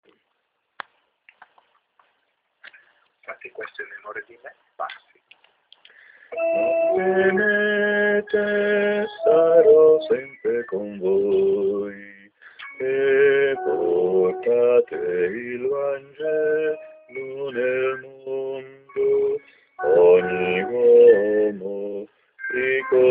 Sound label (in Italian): bassi voce